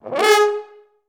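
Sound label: french horn G#3; Best Service; Peter Siedlaczek's Advanced Orchestra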